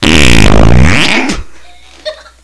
fart

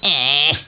ehhh

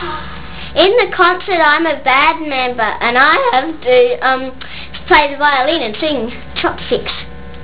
violin

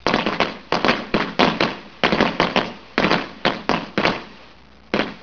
firecrackers

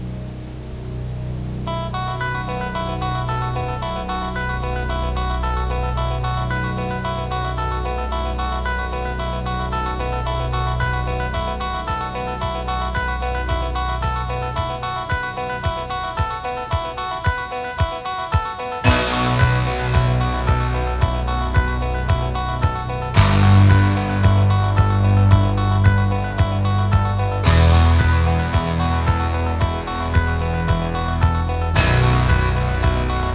tunneltune

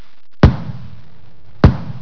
boom

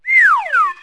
slide down

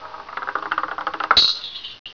ruffle

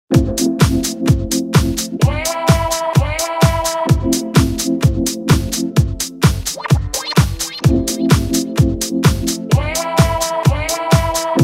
music

